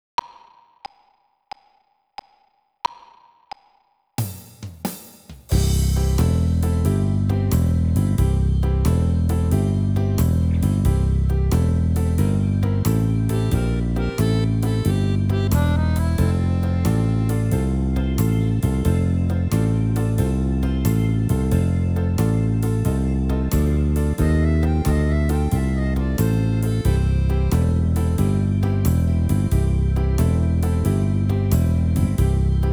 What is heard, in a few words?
Blues and Shoes Base musical